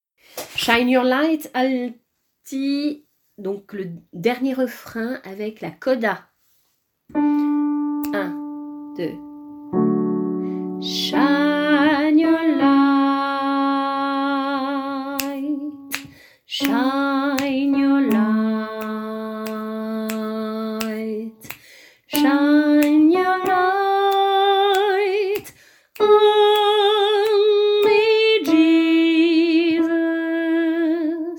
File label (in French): dernier refrain alto